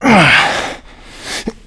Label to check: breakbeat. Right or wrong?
Wrong